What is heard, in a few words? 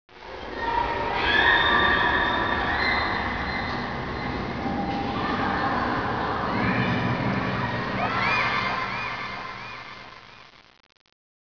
children